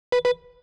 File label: baloon intense